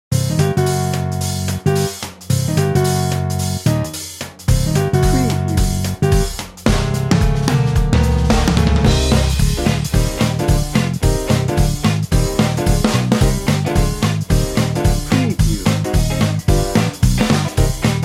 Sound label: jitterbug jive bed